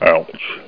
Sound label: ouch; beerwar